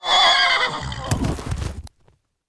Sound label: horseman die; propack